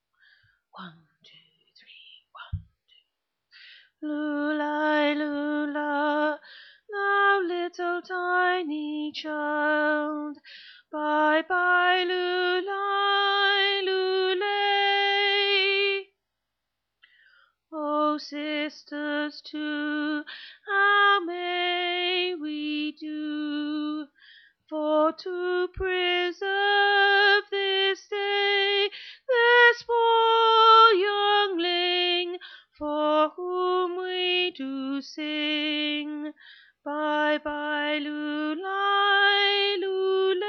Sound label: Alto